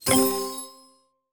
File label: lion collected